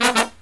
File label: LOW HIT, Brass